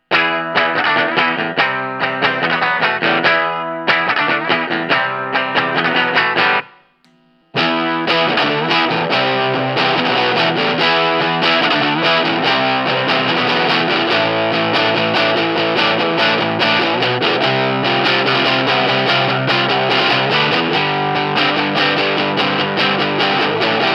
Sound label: gain and range at 3 oclock; Balls Deluxe